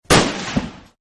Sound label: AK47 Shot; Assault Rifles; Guns; Partners In Rhyme